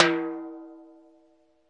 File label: TIMBALES; single hits